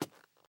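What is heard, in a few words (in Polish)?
kroki cichsze pojedynczy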